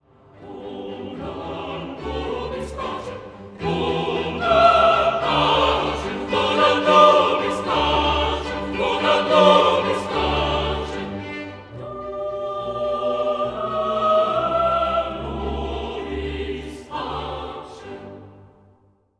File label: Chorus